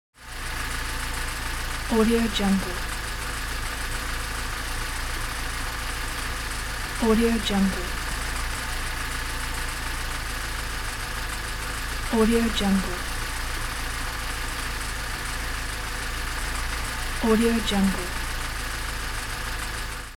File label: car engine